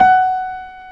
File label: F#5, ORCHESTRA; Roland - LA Composer vol. 1